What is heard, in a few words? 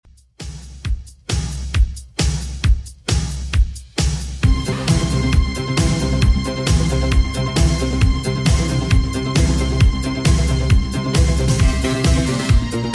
80s Redrum 134bpm